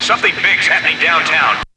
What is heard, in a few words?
VOX; Zero G; Man Machine